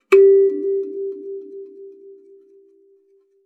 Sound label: KALIMBA G4; Xsample VOL-7